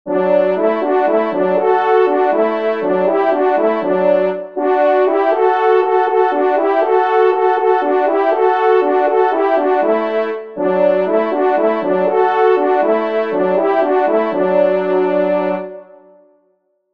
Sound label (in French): Passage du chemin de fer Duo; Fanfares imposables en Concours